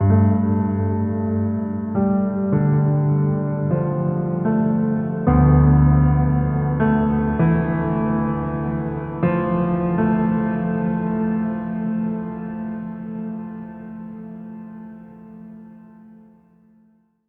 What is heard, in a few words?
Piano Theme; Sonic Foundry (Sony Creative Software); Numina II